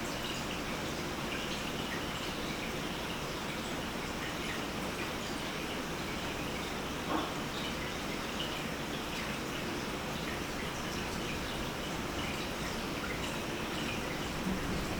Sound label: Unexplained Low knock; Case 032 Wampler Home